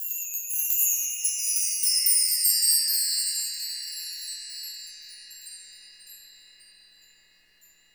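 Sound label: MARK TREE DOWN; InVision Interactive; Lightware VOL-1; Instruments & Percussions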